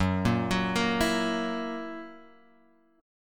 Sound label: F sharp 7th, Guitar, Open G